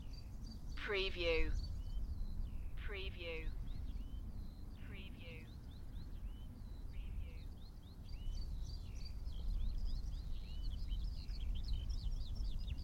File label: Nature sounds loop with birds tweeting; beanstalk audio